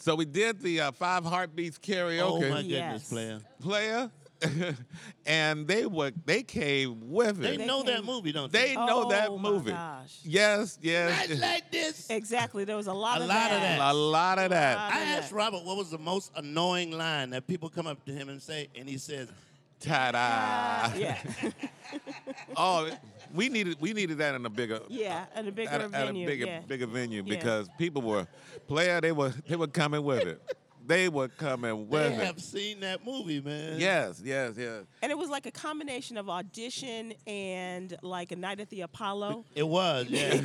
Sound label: heartbeats